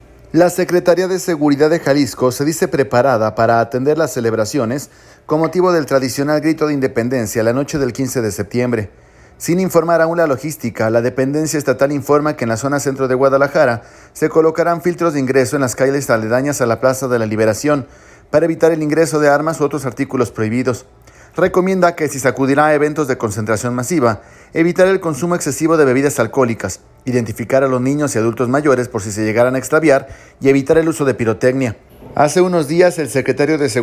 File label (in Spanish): grito